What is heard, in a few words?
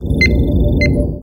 ambient bridge, computer